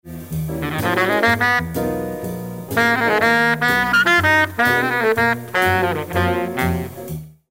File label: kicks